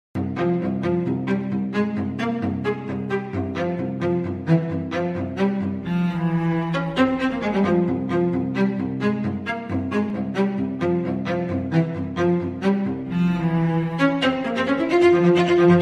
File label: Cello; Wednesday